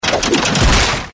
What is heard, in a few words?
tentacle hit; Ben 10 - No Arm Done